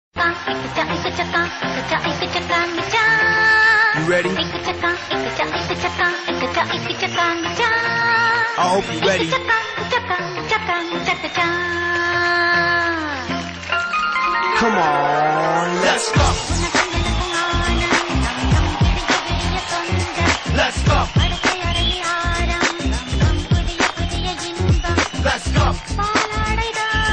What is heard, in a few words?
Classic Hip Hop